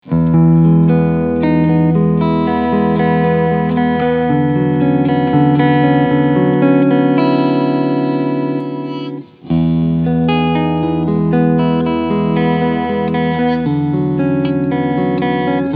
Traveler Guitar TR VAIBRANT Cleansound ALLE Pickups

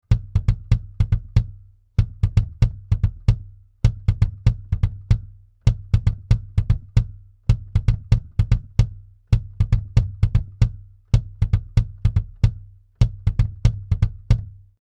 Fredenstein F610 UE1 BD entzerrt

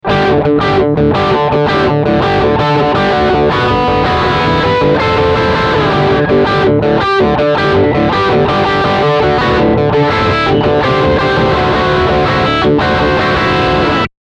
Steg Humbucker Crunch Riff

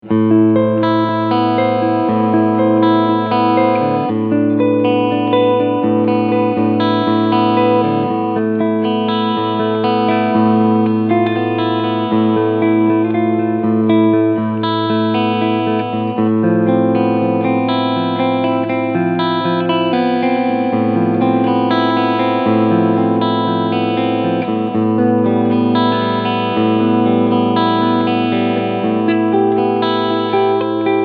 Active White Clean Sound beide Pickups; Harley Benton SC Custom II

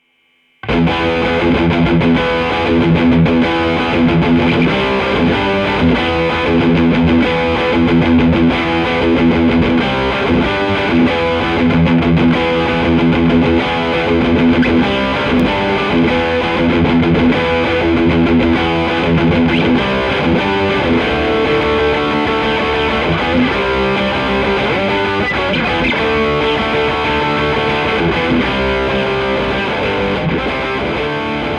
universal audio bock 187 e gitarre fat

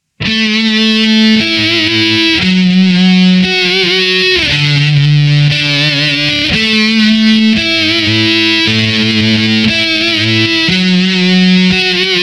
Halspickup; Petrucci BFR

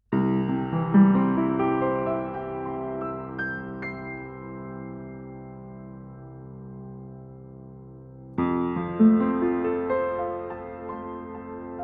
DPA 4060 Piano PZM Mauer

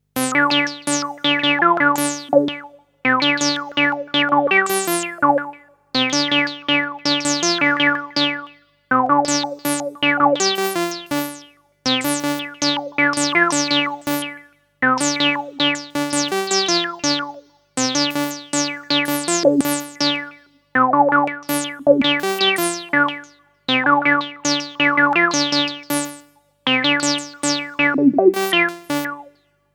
S H auf Filter

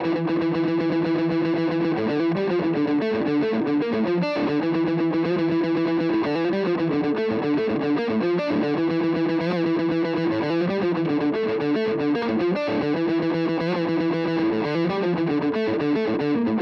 MH 1000 Zerre; ESP LTD Deluxe

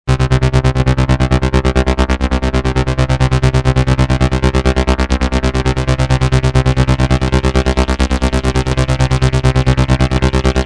Polivoks pro lfo sequenz filterfahrt

